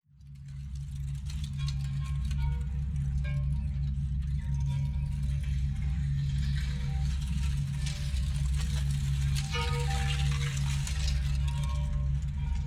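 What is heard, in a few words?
DARK Cbm 77BPM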